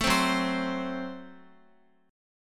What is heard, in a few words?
Gb Diminished Guitar; strummed; JGuitar